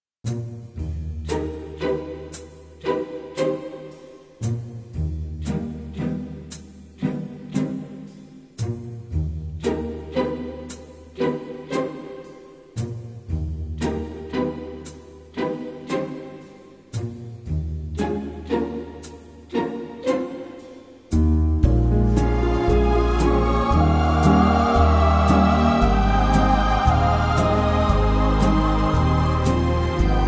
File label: karaoke